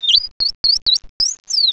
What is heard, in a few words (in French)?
Cri